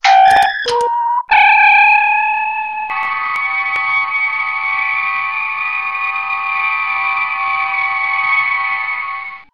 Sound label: Main Transporter